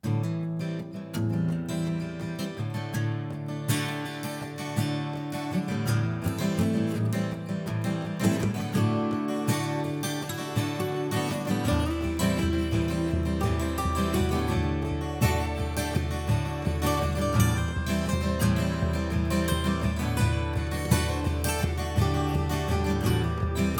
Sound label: Train Song; Holler Folk